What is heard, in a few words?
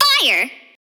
vox